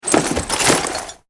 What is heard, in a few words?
foley scramble; cod4; openwarfare